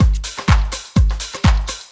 DRM, LOOPS 125, House

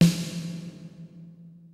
kicks snare, kid; Kid Nepro; Elite; KICKS SNARES

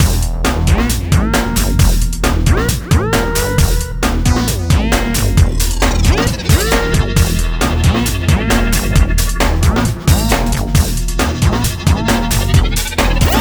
Mellow Jack, 134 Emin, Hardcore Breakbeat; Big Fish Audio; Hardcore Breakbeat Action

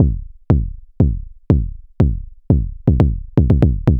Anabeat Kick; Sonic Foundry (Sony Creative Software); Prototechno

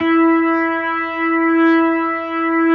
E4, B3

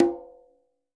quinto; Sampleheads; NYC Percussionworks

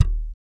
VALLEY FUNK, Bass; Spectrasonics; Bass Legends

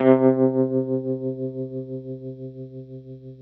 GRTCH TREM C2; Sonic Reality; American Heartland

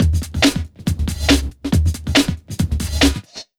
Big Beat Drumloops

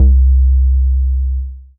C Bass

